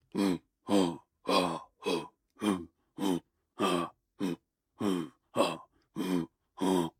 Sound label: Sentry Patrol Loop; Hollow Knight; Ruins Great Shield Sentry Patrol Loop